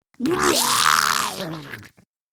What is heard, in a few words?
Spawn Grineer Ghoul; Grineer Ghoul Expired; Battle Barks